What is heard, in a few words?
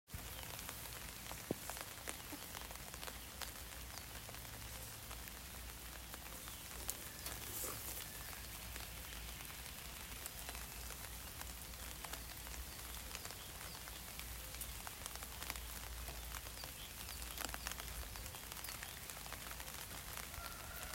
light rain with birds singing peaceful nature sounds